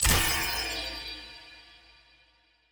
UI Click; Karma; bloom minigame

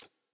Cable Rolling; Puzzle

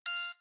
Turret Button

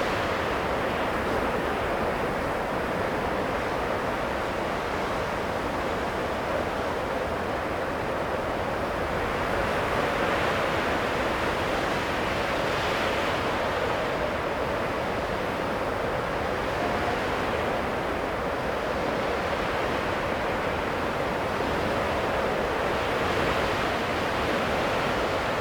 AMB; DEMACIA

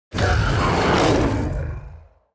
Enemy Throw Rock; VO; bloom minigame

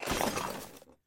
item rummage tools; courtyard

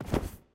library puzzle book drag

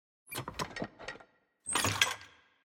Music Box Disc Loader

